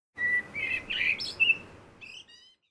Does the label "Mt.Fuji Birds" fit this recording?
Yes